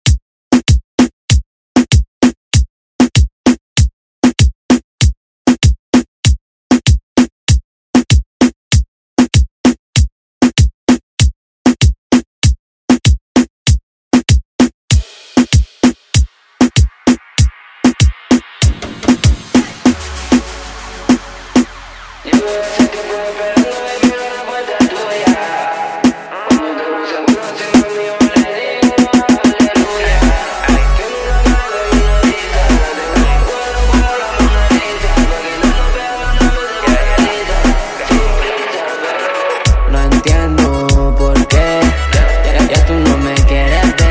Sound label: Latin Urban Redrum snippets; Deejay Hit